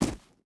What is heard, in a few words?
footstep boots gravel jump land; Rust